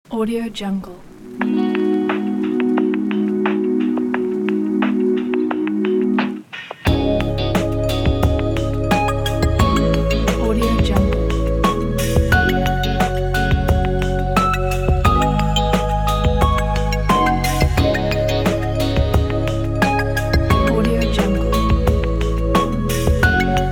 city groove

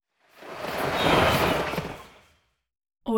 turbulent whoosh

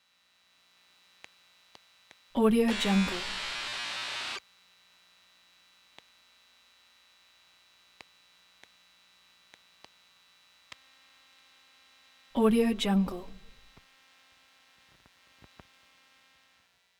beeps squawks static; prosoundfx

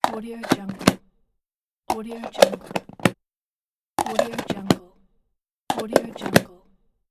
plastic box closing

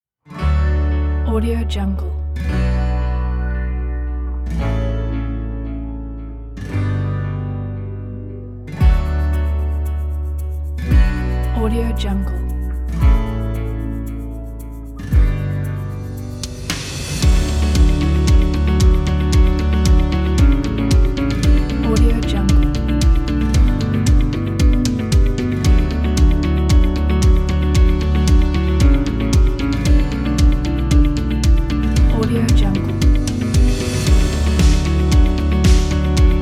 corporate upbeat cinematic

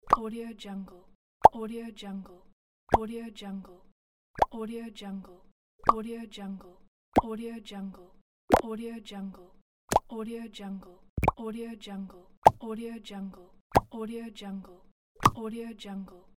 puzzle tile correct placing

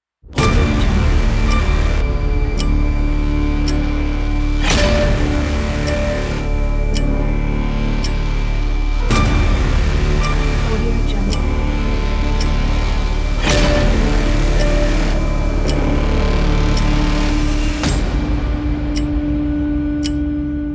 dark city kit

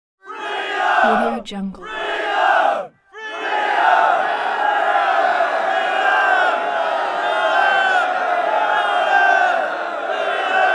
1000 men chant freedom to yelling

